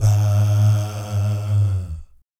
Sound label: VIB FX, Jazz FX